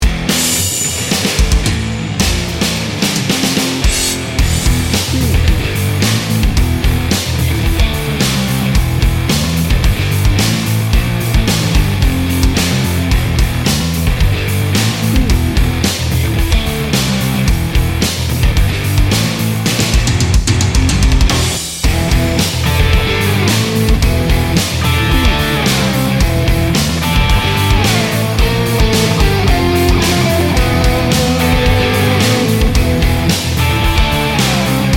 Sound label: hard rock grunge